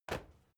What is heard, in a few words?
step crowd march quiet single right; schritte